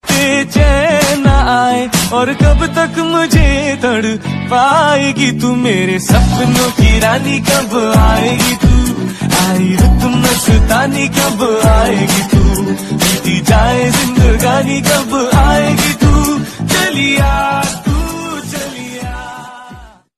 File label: sweet bollywood ringtone